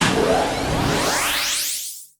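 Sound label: wild expansion sound; starburstxxxtremeusa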